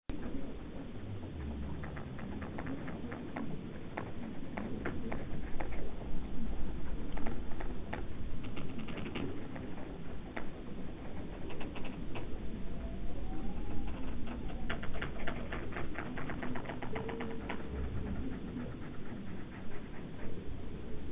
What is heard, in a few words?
walrus; arctic; mammals